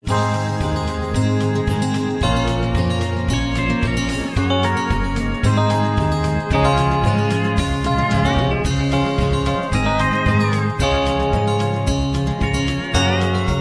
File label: High Key